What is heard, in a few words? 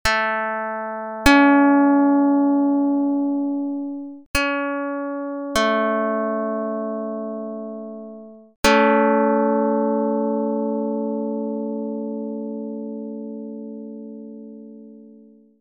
pluck dr220